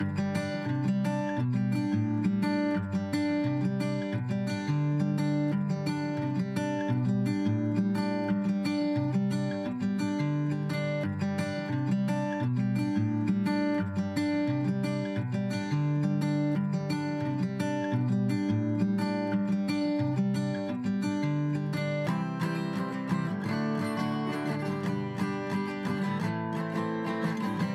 Band Guit Accou LOOP; barbie dreamhouse adventures

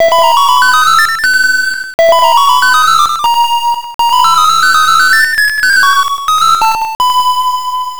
Aoba Flute; Tengai Maky II Manji Maru; TurboGrafx 16

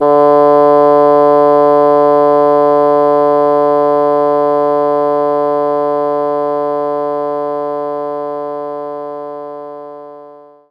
Bassoon D3

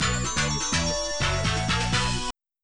Level Clear; Lori; Jazz Jackrabbit 2

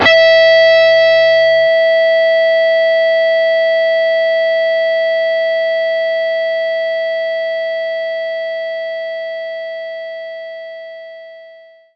Overdrive Guitar E5

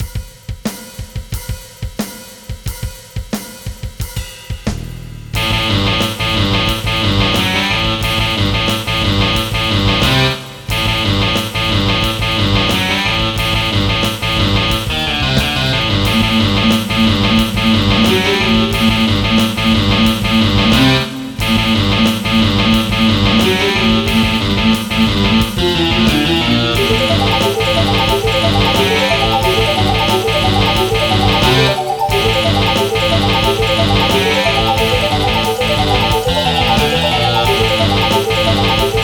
Rock; Metaltech Battledrome

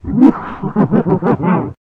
run; I Am Weapon Revival; Jockergrimm

